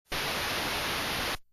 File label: high frequency white noise